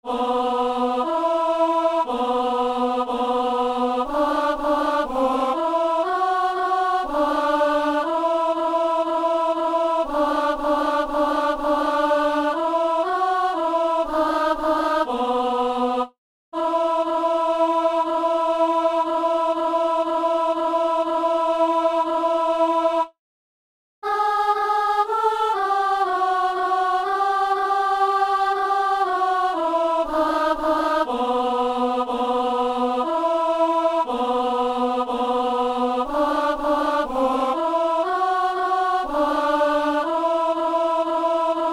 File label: alto; Crucem tuam; Messe du 17 janvier à Saint-Denis